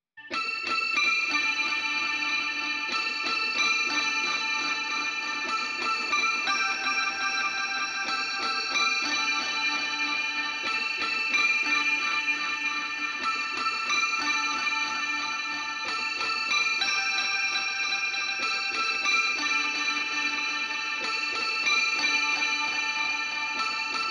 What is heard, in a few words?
Bells